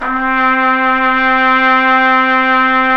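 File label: trumpet; Tpt p; Solo Brass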